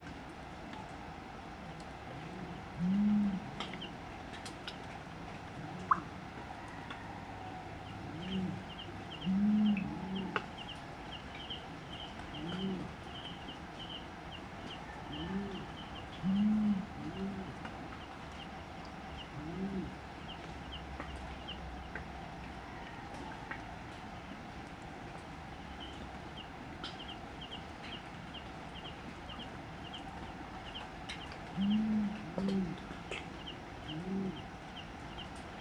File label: Brown Fish Owl Hooting Duet Of Male Female Separated In Stereo Image